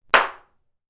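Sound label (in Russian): пощечина хлопок по лицу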